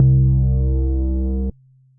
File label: Round Bass; Gemini; Ableton; Glitch and Wash